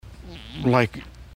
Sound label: fart